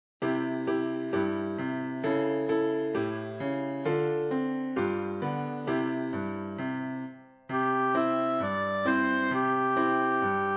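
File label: oboe; Jingle Bells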